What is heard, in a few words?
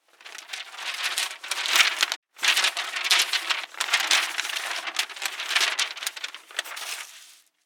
paper fall; printing